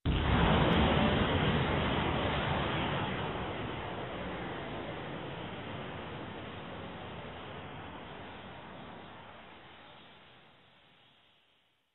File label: Fire Explosion Distant